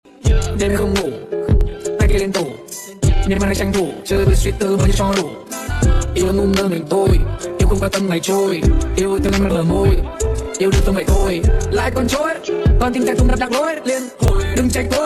Nhac Chuong RAP

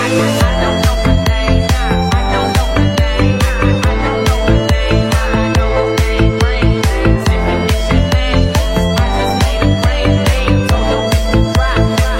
Nhac Chuong Remix Khong Loi

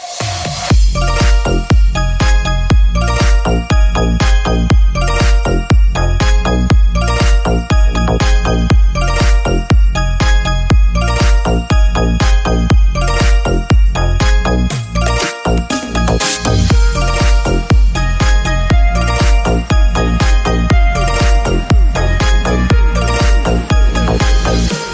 Nhac Chuong Nokia

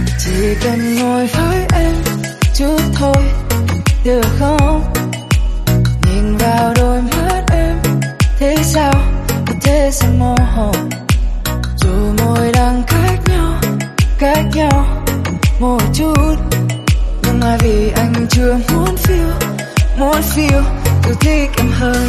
Nhac Chuong Remix

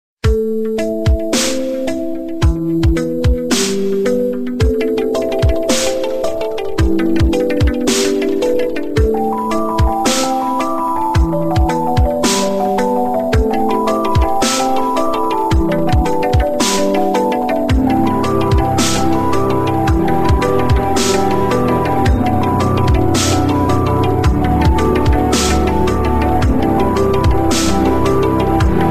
Samsung, nhạc chuông

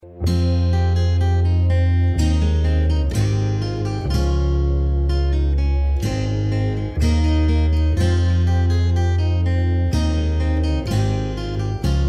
bao thuc chillin

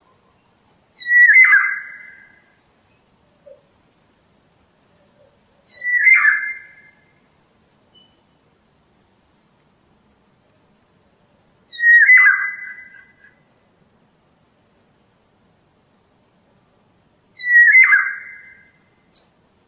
Tieng chim Vang Anh hot

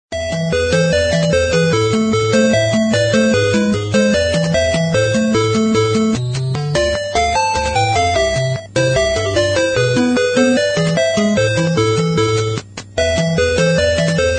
Game xếp hình, nhạc chuông

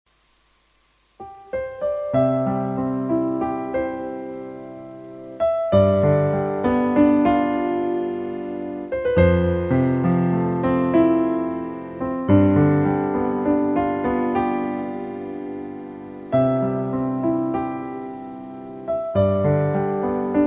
Nhac nen ke chuyen mam non nhe nhang

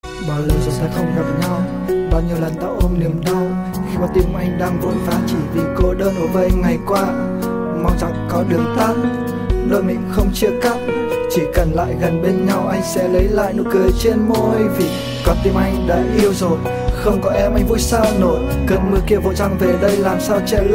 rap

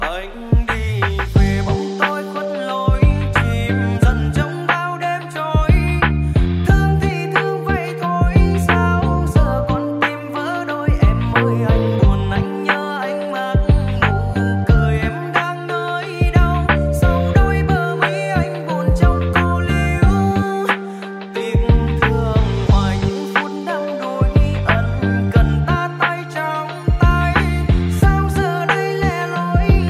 Nhac Chuong, Lofi

